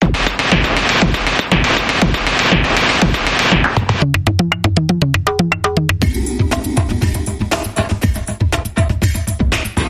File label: Ogre Dance Bumper Reversed